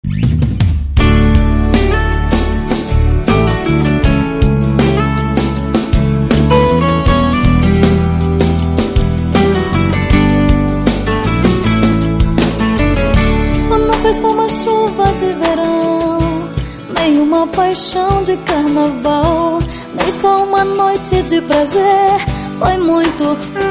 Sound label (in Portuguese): banda acustico